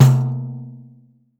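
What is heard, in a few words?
LARGE BRAZIL, PANDEIRO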